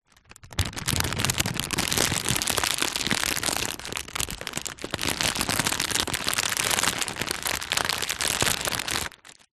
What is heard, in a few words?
food potato chip large packet movement; zapsplat